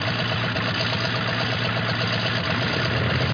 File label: SKY BIPLANE BANNER; Frogger 1997